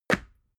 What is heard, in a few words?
water boiler put down short